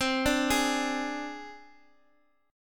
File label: C Suspended 2nd Sharp 5th, down, Guitar, Open D